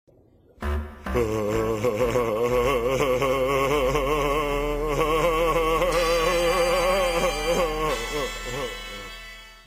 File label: laughing